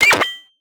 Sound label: snowtank button; boss